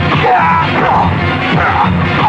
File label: fight scene, Kung Fu Construction Kit